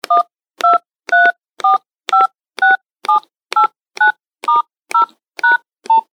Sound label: handy dtmf tones with key noises